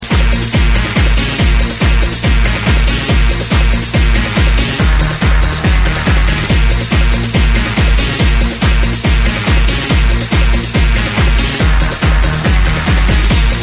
instrumental club mix

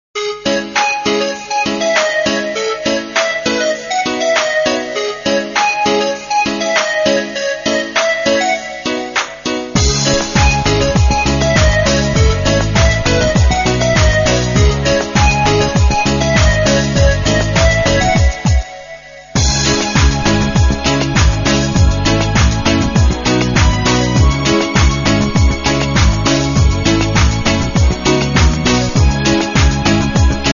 Instrumental Version

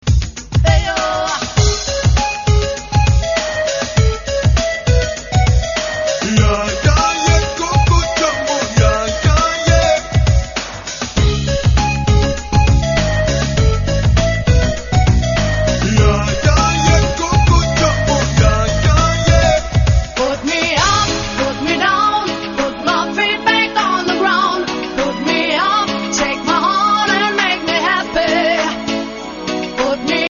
Groove Version

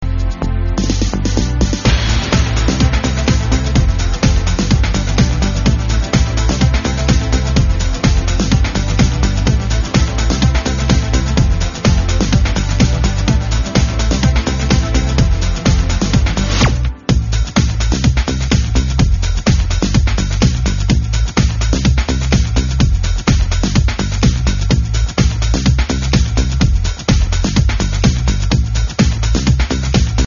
instrumental

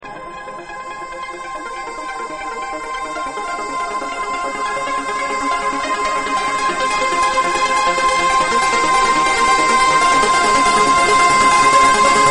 tribal trance mix

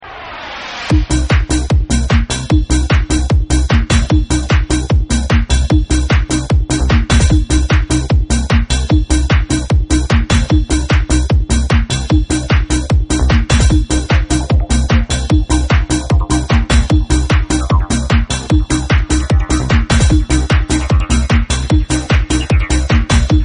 OUTER SPACE TRANCE MIX

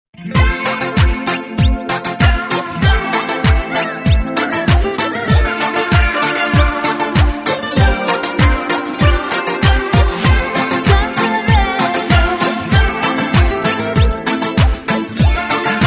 reggae radio mix

